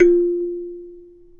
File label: F3 KALIMBA; AKAI S6000; WorldSounds